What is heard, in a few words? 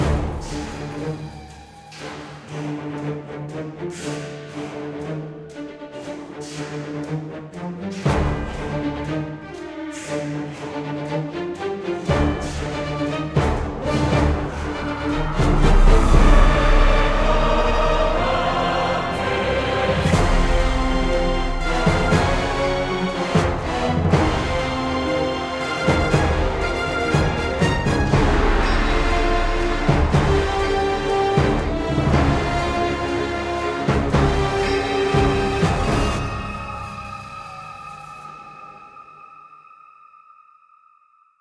Login Loop; Hell Warders